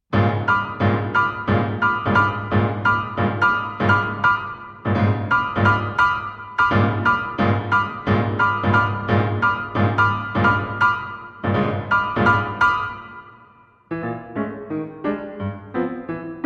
smashing clusters

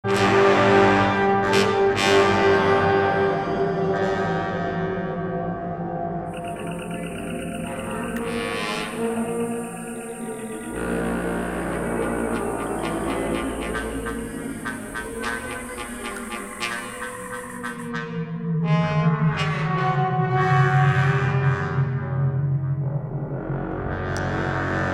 slide

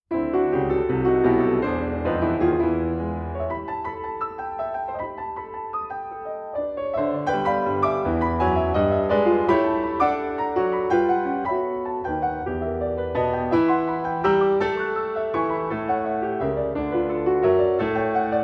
rags g major vivace

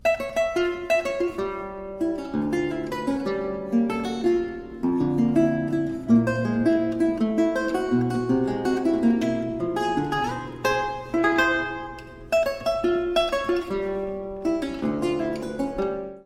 lute suite giga

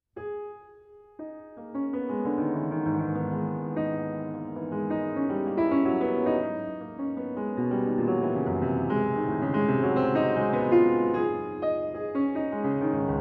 a left-handed waltz

